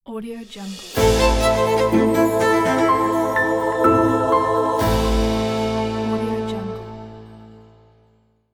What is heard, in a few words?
logo violin